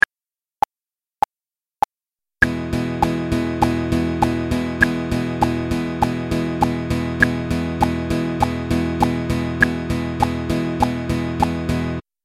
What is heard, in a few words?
straight eighths; 12 Bar Blues; This lesson covers the traditional, quick change, and shu